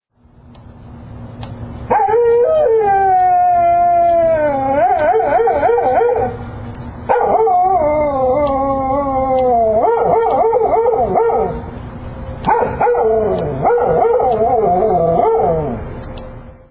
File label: buddy howl